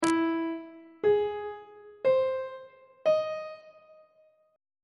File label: c sharp melodic minor 7th chord 3 is e augmented major 7th on treble clef